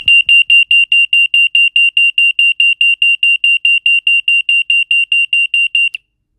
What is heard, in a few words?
indoor tsunami siren